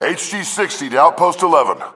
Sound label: heavy grunt